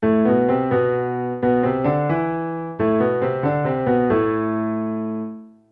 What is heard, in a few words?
triplet in am